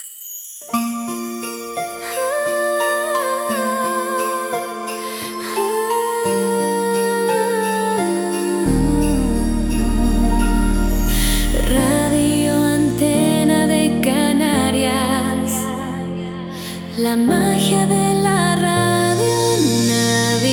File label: bells; La magia de la Radio Navidad; Antena de Canarias